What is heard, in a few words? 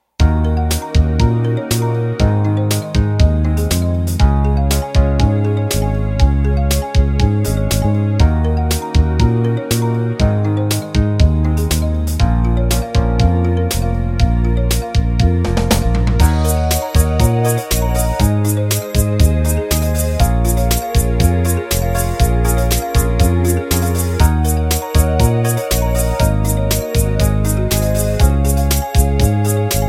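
8 beat analog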